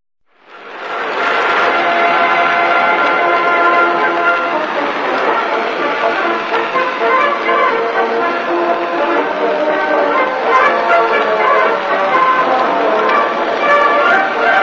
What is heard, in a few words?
interval signal; vot people